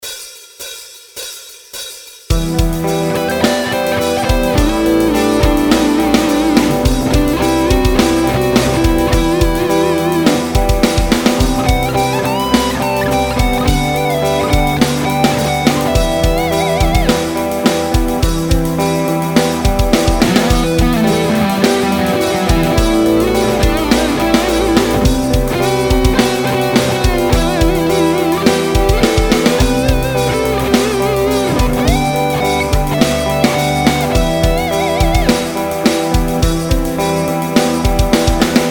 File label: Improv No Adjacent Strings